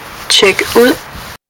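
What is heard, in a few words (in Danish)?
rejsekort check ud; Rejsekortet - lyd fra stander.; April 2013, Odense Sygehus St.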